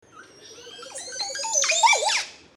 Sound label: Weird Bird; Recorded at Zoo Ave, Costa Rica; Kuma Sounds